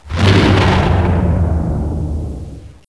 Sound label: BB Hit